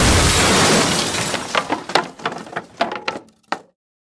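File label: noise of broken glass and crumpled metal after a crash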